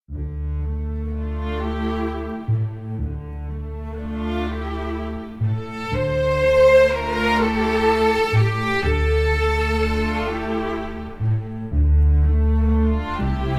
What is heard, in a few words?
Waltz